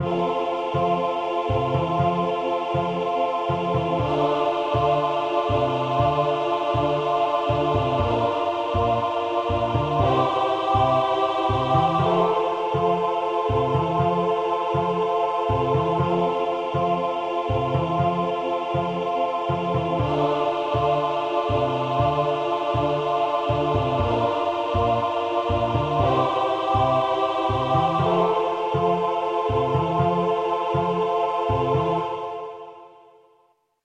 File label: SAT chords all, choir